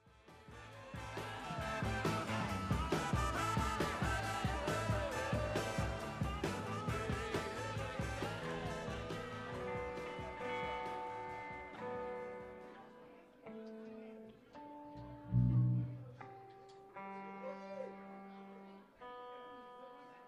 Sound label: tuning